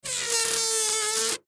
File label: Balloon Air Release Air Squeak; Balloons; Cartoons; Foley